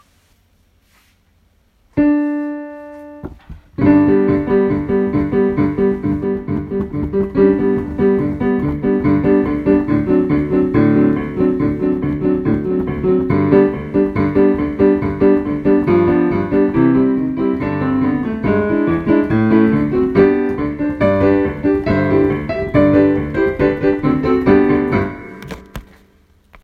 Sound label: Accompaniment; Marcellus